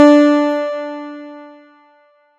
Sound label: Piano Harmonic